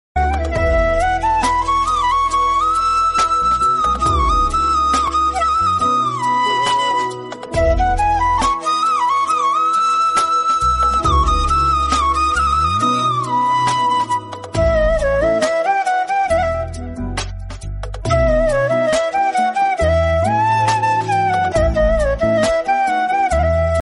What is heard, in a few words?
flute ringtone; ragnarok creation